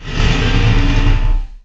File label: move, ambient